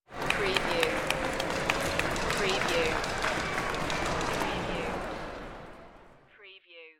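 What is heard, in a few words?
Short Applause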